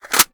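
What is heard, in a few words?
submachine gun reload empty mag in; gmod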